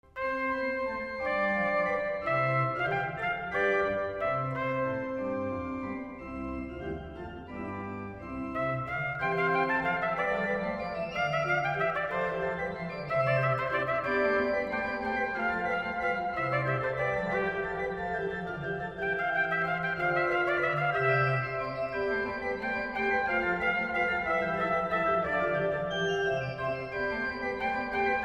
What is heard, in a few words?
Sonata for Trumpet; III; Glovanni Viviani